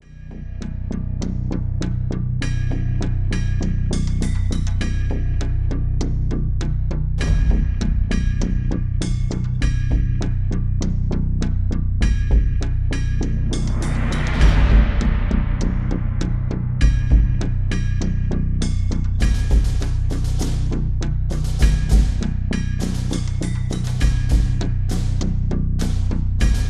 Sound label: OMEGA LIVES SYNTH, soundtrack